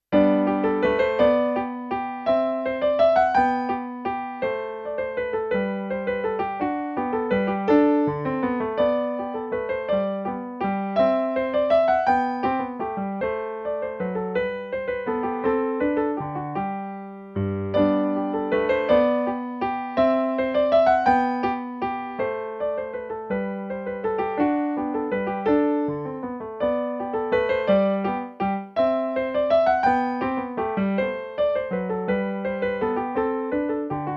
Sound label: bach minuet in g